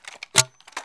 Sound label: c shmag, armi